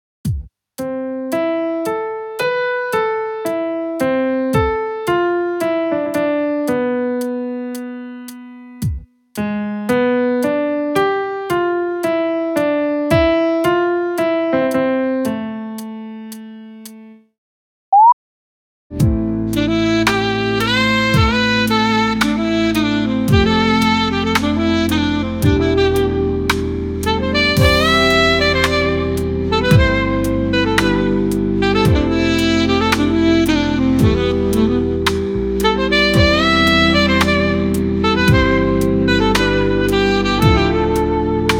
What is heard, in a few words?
Sax